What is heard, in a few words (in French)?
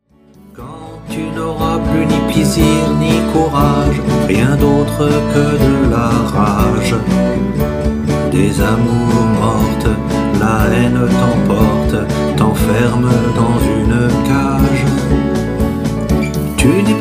Chant